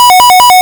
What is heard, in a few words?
Hard PCM Chip; chiptune